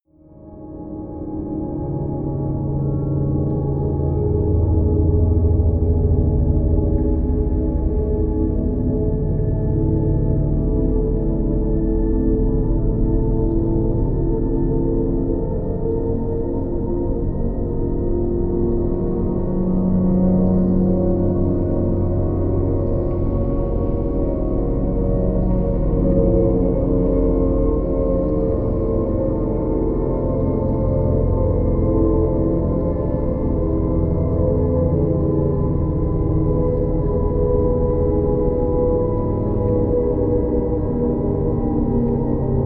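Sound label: rise and fall, music elements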